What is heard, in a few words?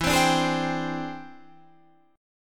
E 7th Sharp 9th Sharp 5th, chord